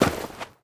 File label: snow, footsteps; player